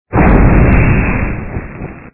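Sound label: attack middle low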